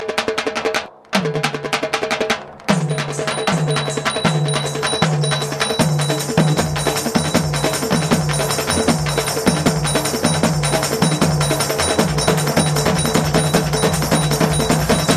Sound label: telugu mass beat